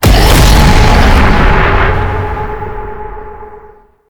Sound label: Octopede Portal Spawn; Warframe; Duviri Murmur; Octopede Encounter